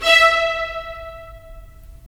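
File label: strings violins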